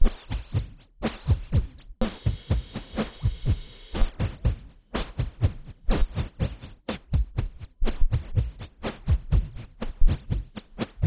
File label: gen drum